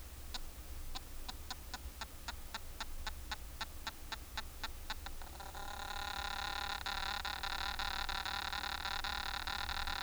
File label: Psylla Duet; Jocson